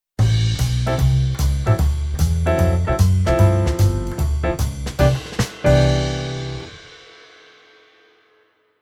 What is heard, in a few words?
Keyboard Pf Jazz